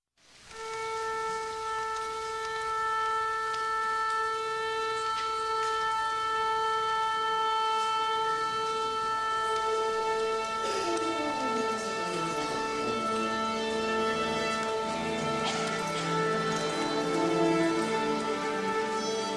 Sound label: Dvorak Romanze Tuning